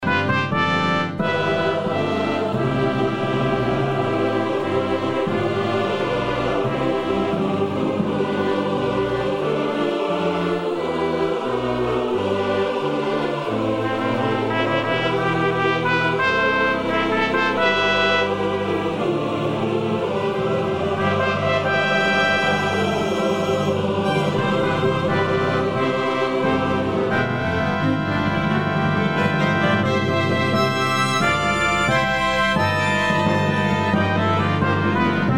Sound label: Synth